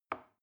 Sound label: plastic button small release soft